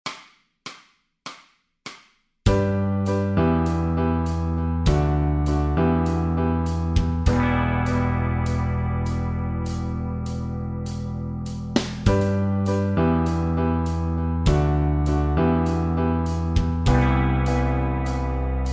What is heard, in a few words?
guitar scales, backing tracks